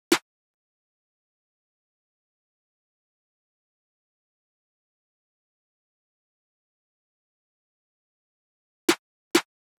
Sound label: Drum Loop - 65 BPM Snare; Loop Stems; Cymatics; DOPE DRUMS VOL. 1; Peach